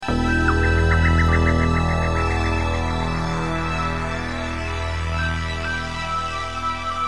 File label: ringtone